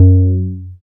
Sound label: BAS FM DX-7